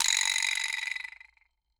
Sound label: VIBRAS